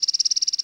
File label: Chirpy Radar High, beeps tones